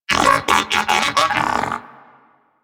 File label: Moa Pet Enemy Killed; Warframe; Pets; Tenacious